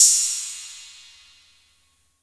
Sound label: Ride Hi Acid House; Drums